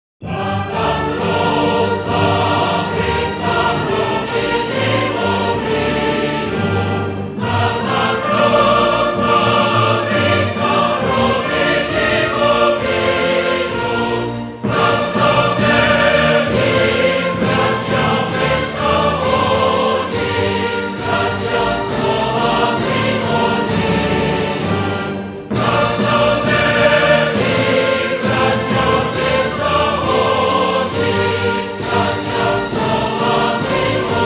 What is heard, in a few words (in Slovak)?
SLOVENSKA Hymna